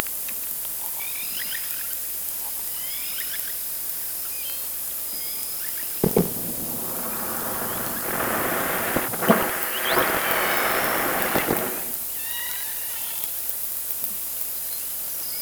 Orca sound Night Passby

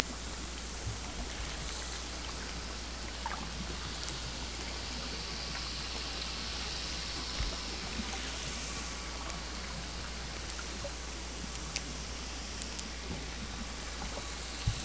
Hydrophones, orcasound

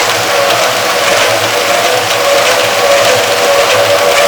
Orca Noise

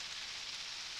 Background Noise

